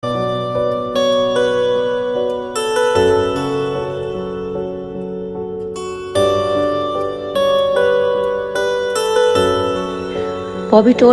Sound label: Instrumental